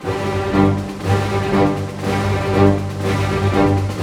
SNAR, Snare Army; East West; Scoring Tools

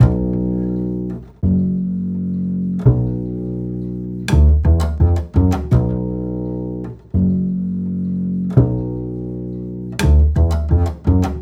Acoustic Bass

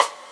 Gate Rim, Drums Acoustic; ALL RIM; USB Soundscan vol.10; AKAI; 90s sample CD

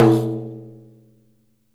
DAIRES OPEN, Mediteranean Traditions percs

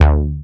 Funky, One Shot Bass; Drum & Bass; Sonic Foundry; Futurist Drum & Bass

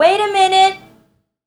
Vocal; Sonic Foundry; Infinite Soul VOL-2

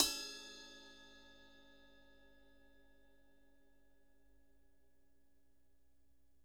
ride bell; Sonic Reality

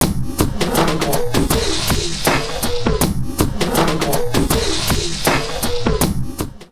Drum Loops Crazy Processed; USB Soundscan; AKAI

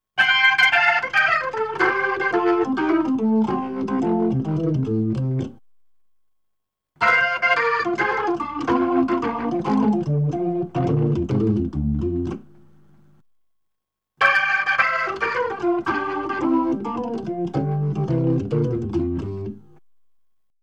B3 Organ; Masterbits CLIMAX 8

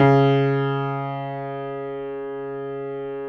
jazz C#2, Keyboards Acoustic; USB Soundscan vol.17; AKAI; 90s sample CD; JAZZP ST

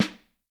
Snare Drum, 5X14; Vintage Snare Drum; Q Up Arts - Johnny.C's Vintage Snare Drum

